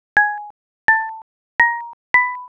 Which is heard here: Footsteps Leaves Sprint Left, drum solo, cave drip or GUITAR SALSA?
cave drip